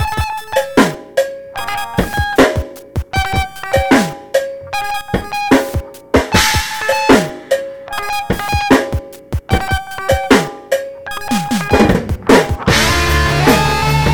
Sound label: metal; metal max 9